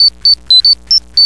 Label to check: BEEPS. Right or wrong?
Right